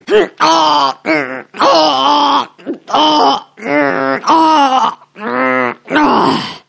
vacuum a frog; animals

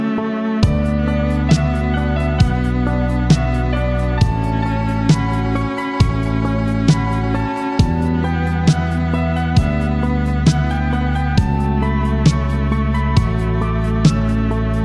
Piano Violin Melody

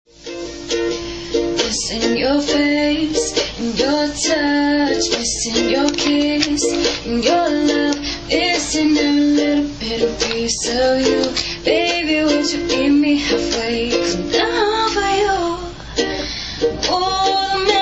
lullaby short

